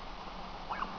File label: young goose chirp, Anser